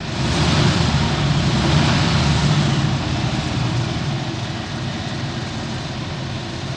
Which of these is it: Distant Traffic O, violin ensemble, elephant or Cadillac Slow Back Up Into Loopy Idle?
Cadillac Slow Back Up Into Loopy Idle